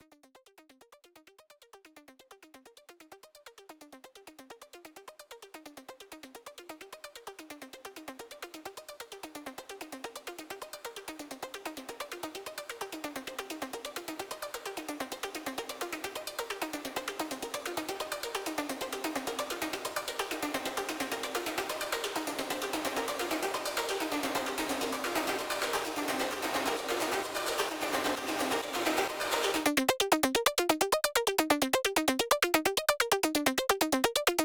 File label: Arp (Intro)